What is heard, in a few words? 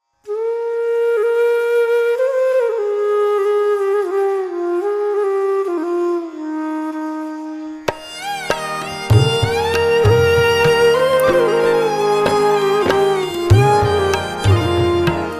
child protection jingle; BITIYA MHARI; radio4child awards 2024